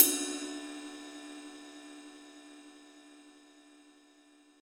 ride sound clip d key, Royality free ride cymbal single shot tuned to the D note.